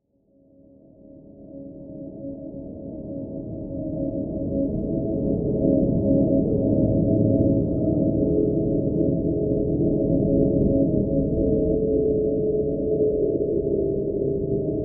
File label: Dark Ambient Synth Drone